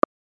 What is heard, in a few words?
click